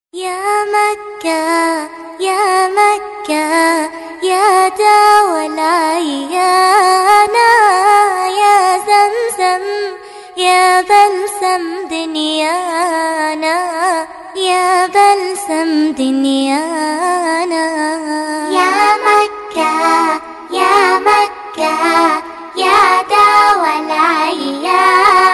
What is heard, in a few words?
Islamic Tone